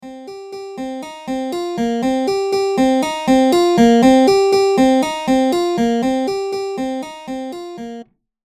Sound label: No Filter, Harpsichord sounds with no filter, rising up in velocity and then back down; factory preset Garageband Harpsichord